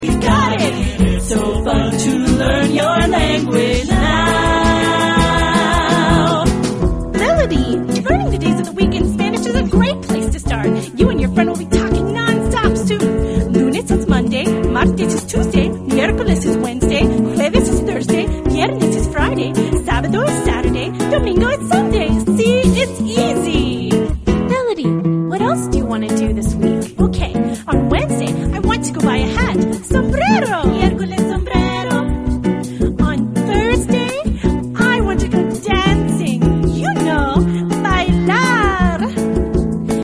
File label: melody